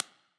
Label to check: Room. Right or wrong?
Wrong